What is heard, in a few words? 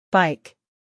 bike